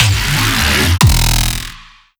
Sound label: shockwave rise bass